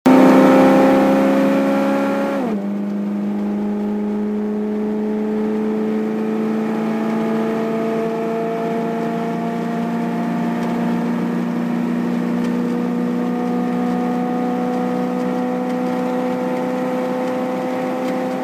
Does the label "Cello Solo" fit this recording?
No